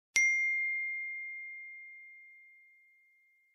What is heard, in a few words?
sms; aifon